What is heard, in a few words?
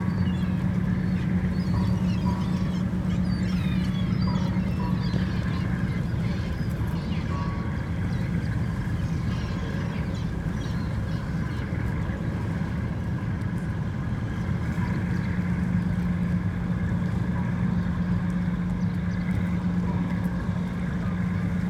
Istanbul port